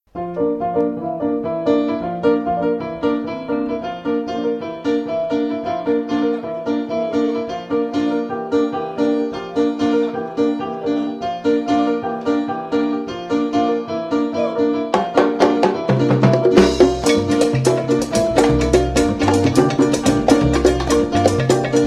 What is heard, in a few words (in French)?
musique Tumbao Libre